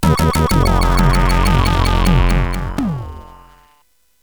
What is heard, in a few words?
Jingles, CSG8580R5